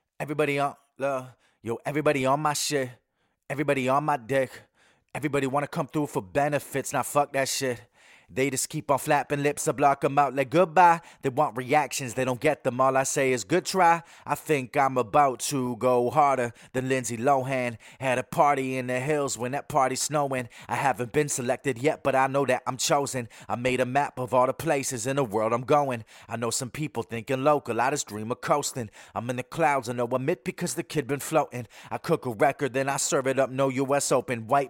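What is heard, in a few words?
hip hop 83 DM, acapella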